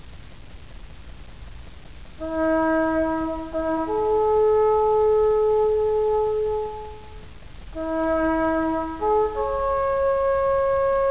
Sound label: taps